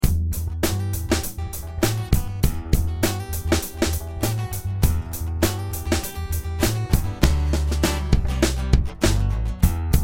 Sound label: jam